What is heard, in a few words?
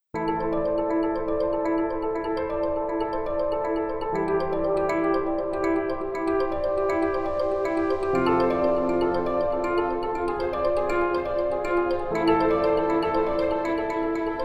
soft music; Hennessy; Jean Christophe Quilez